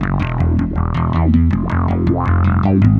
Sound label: Sequence Bass, Drum N Bass; Sonic Foundry (Sony Creative Software); Knutrix Drum & Bass